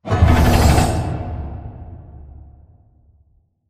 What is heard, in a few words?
giant metal door open slide; Titanfall 2